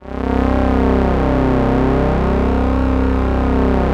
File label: Atmo Pads; Best Service; XX Large